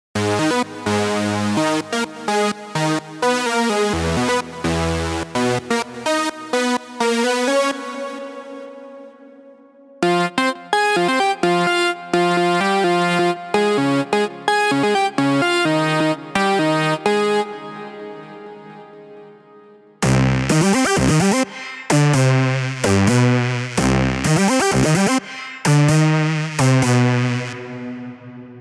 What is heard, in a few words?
Melodies Big Room Progressive House